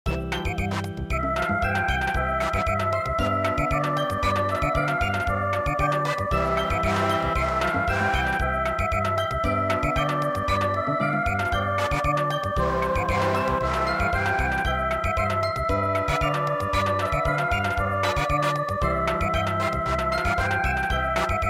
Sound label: Valse Alsacienne Samba